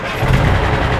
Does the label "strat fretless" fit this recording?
No